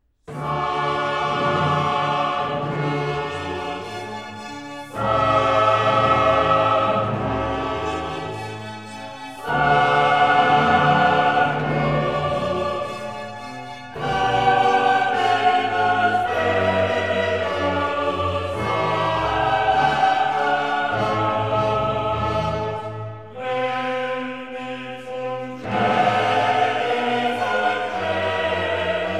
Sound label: Requiem for soloists, chorus, and orchestra, Sanctus; Mozart